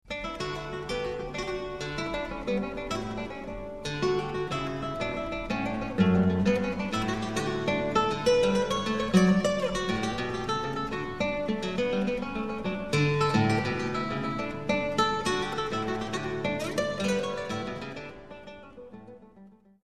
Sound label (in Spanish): canarios; gaspar sanz; el barroco